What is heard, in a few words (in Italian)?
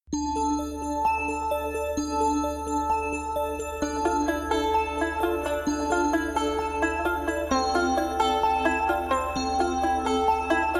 strumentale; l'estrange deluge